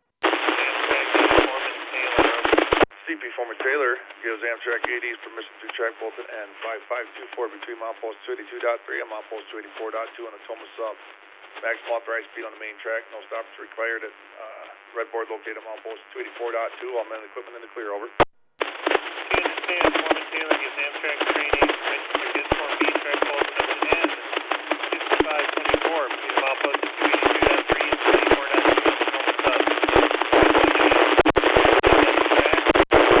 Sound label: Amtrak